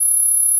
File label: PeakSlope C#1